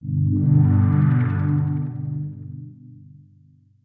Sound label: health mono; torque3d